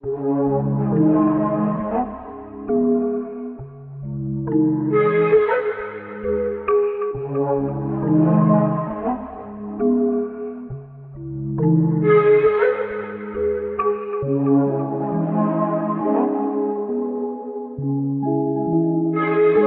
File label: 135 BPM C# Min, Melody; Cymatics; 2022 Melody USB Expansion